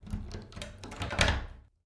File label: Door Skeleton Key